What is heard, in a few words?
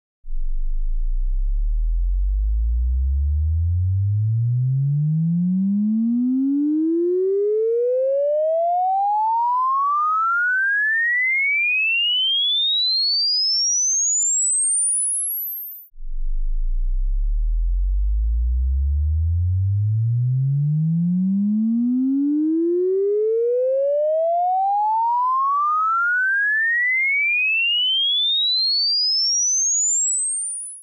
Left-Right Test (Sine Wave Sweep)